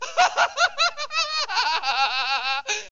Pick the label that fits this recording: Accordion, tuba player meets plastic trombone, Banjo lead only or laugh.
laugh